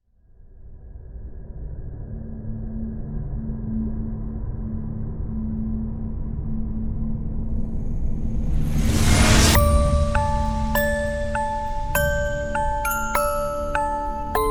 colossal trailer music lullaby creepy build up horror music